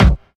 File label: clean steel kick drum b key, Royality free kick single shot tuned to the B note